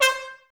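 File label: brass trumpets C; Miroslav Vitous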